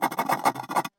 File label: scroll write; Crafting